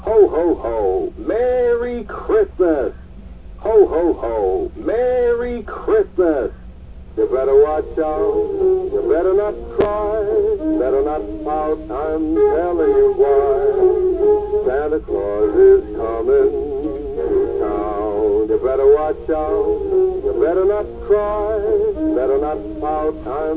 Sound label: Merry xmas, Human singing song